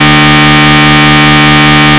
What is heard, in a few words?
fm cello single; cosine; FFTimbre